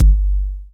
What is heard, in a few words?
BD; Best Service; Dance Mega Drums